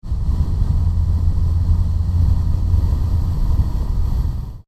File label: loud atmospheric